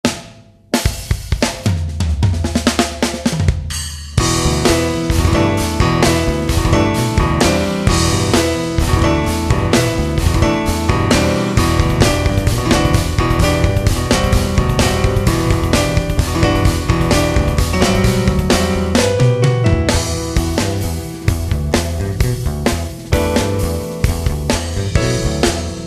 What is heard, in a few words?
rim; BrokenOrange